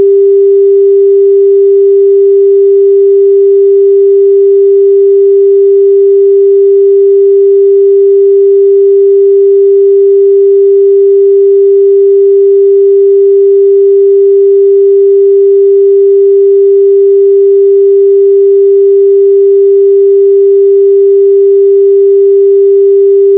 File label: root chakra 396hz; Chakra Healing; liberating guilt and fear